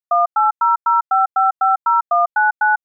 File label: dtmf dial, dial tones